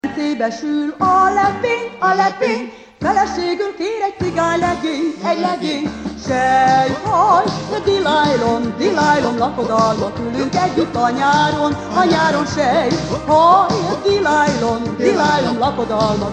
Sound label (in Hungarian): Cigány slágerek disco ritmusban; Cigány zene